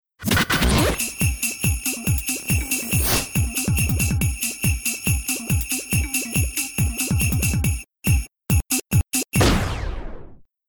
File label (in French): Base rythmé